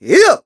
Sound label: Attack; Ghirahim attacking; zelda sounds; Skyward Sword